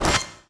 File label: Cube Pickup